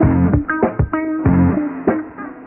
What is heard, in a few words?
guitar, Loops, Phonky